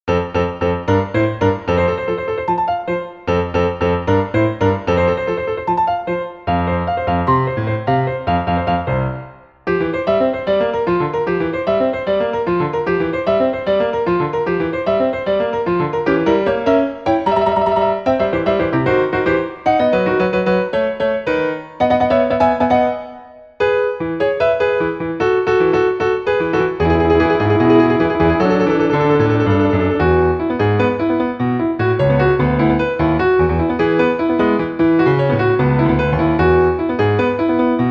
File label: piano; Balloon Fight